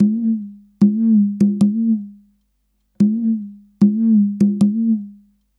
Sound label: Slides 080bpm, African Drums, Conga Slides; Quazibeat; African Secret